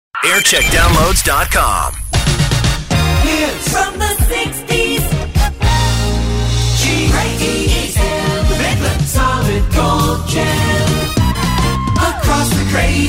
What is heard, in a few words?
Jingle Montage; GEM-AM Nottingham/Derby; Jingle Montage (JAM) 1992